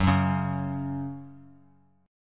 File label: F sharp 5th, down, Bass Standard; strummed; JGuitar